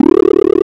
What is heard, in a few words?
wand; superbroswar